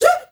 FEM GRP, FEM NOISE

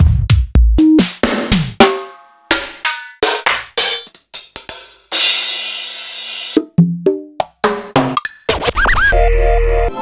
Classic House; proteusvx

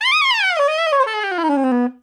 CRAZY DN, SOPRNO SX

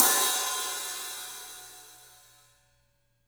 Studio Hats, 3DPercussives

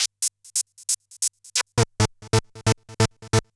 Kaossilator gated (130BPM); musicradar

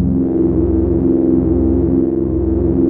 JUP.8 E3, JUPITER 8, Rare Synthesizer; Hollywood Edge - Giorgio Moroder Rare Synthesizer Collection

